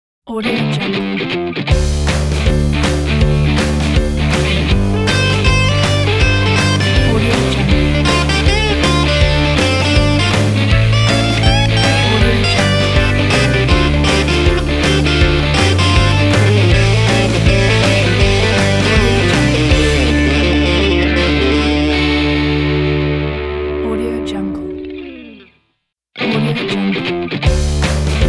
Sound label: driving uplifting rock ident